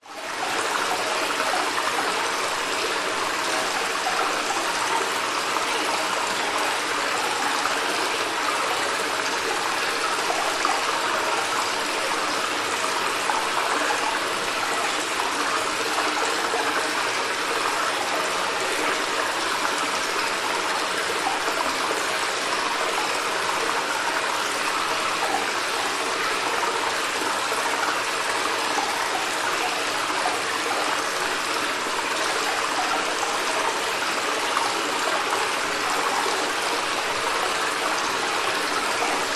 nature cave ambience water run drip trickle

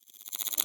Menu Close; soundbits

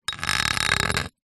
household garden trowel scrape on concrete

